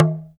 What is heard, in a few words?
TALK DRM, Heart of Africa; Spectrasonics